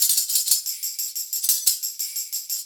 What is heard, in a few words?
90 TAMB